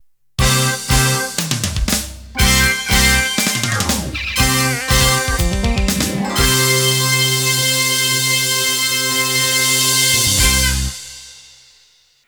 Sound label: Fire Rock End